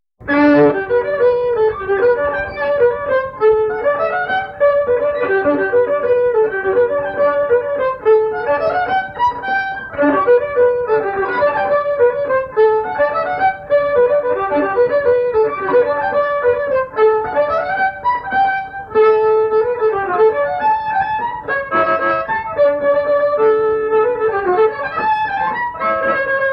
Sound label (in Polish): skrzypce, tramla